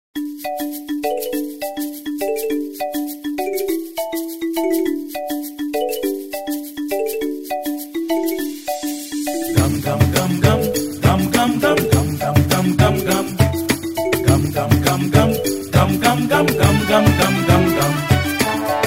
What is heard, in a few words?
Karaoke